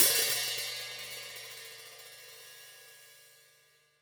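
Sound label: close Open Hat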